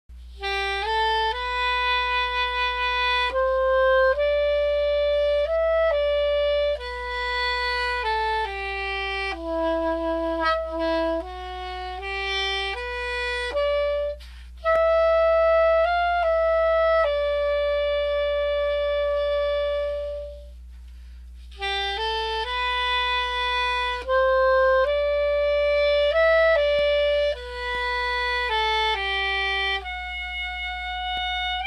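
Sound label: B flat Curved Soprano Sax Irish Interlude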